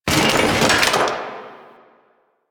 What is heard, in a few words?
chair destroy; ambience; Warframe; Entrati